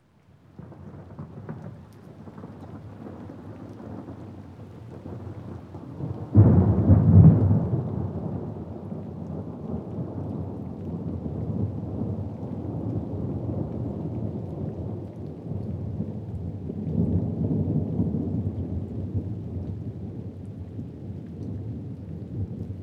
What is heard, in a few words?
Thunder Roll (With Rain); BBC Sound Effects Library; Weather II